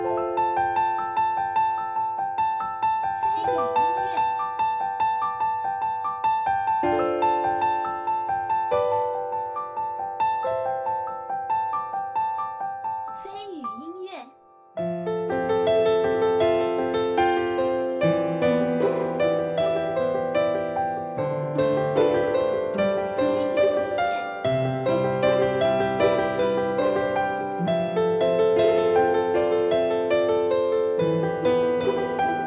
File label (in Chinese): 悠扬 舒缓